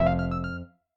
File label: combo 3 power